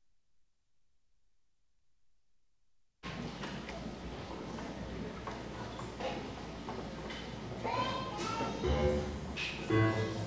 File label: tango latino